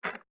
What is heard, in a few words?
ui pickup spring; Rust